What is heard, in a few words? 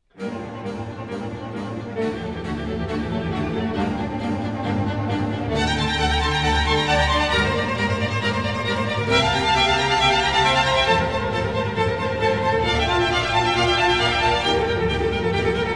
Flute Concerto in G Minor Allegro